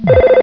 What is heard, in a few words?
phone